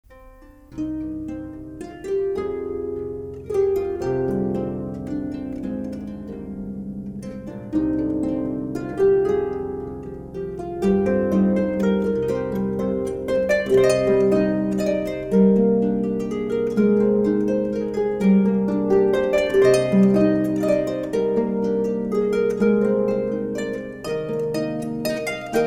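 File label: Celtic Harp, also with 2nd harp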